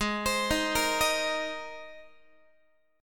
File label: Ab Major Add 9th Ab Guitar; Abadd9 arpeggiated; JGuitar